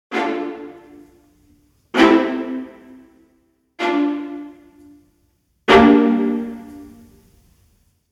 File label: Viola Section Spiccato; NOVO